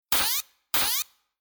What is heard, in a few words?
Future Telemetry Static Beeps Buzz Button High Interface; Tom Hutchings